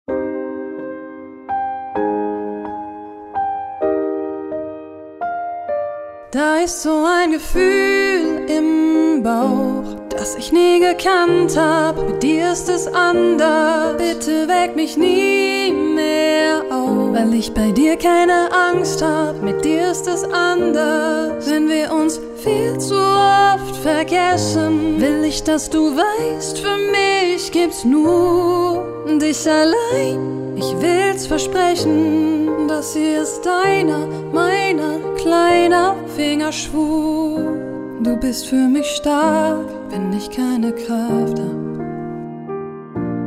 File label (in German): Hochzeitsaenerin